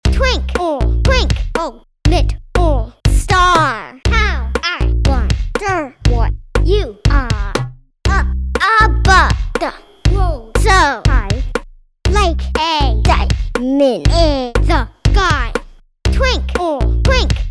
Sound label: twinkle